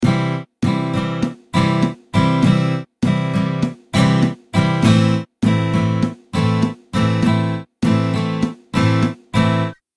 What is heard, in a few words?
strumming pattern